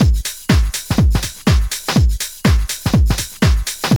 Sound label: house loop